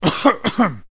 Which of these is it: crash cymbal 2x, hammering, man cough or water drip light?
man cough